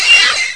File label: pulse; hightech